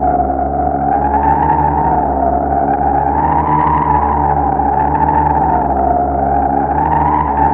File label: threat drone; Zero-G Ambient 1